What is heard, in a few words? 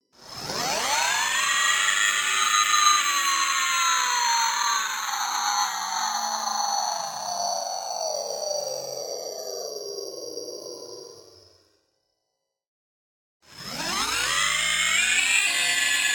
Digital Scream Power On Variations